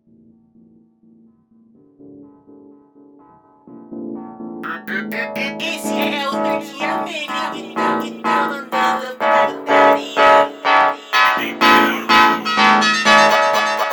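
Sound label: slowed, phonk music; funk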